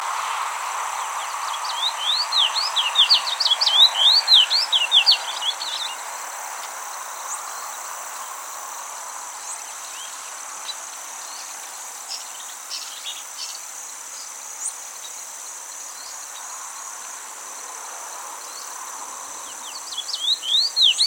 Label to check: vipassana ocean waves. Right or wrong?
Wrong